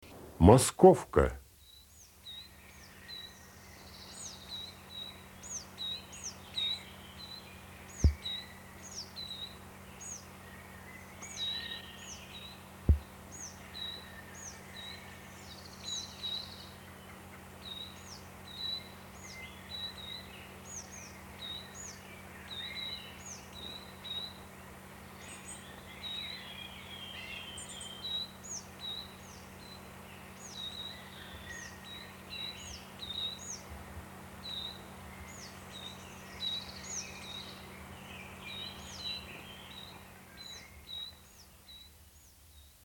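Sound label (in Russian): moskovka golos, coal tit voice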